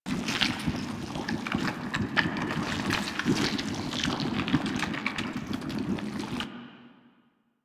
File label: Destructable Cyst Loop; Ambience; Props; Warframe; Infested Eris